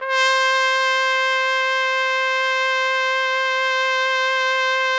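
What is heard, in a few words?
TPT, trumpet; Roland - LA Composer vol. 3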